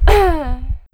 fall; player

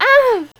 pain; player

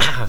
pain; player

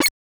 tick, weapons proxmine

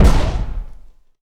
fire, weapons proxmine

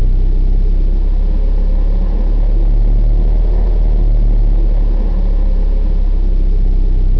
railgun hum

